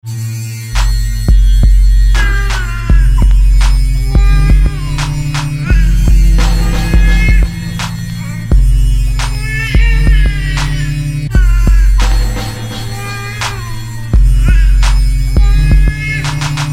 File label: Dirt instrumental